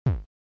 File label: Arcade Action Impact